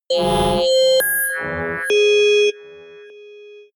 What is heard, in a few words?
synthesized bells medium; digital